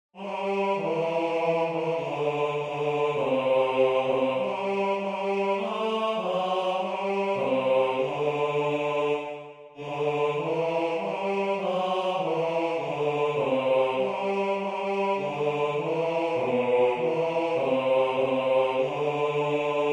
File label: Bas; Laudate Nomen Domini